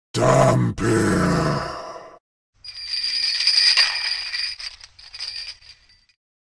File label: The Creature